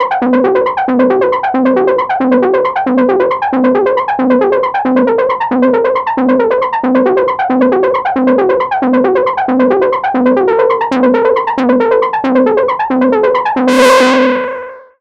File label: Noisy Arp, sequential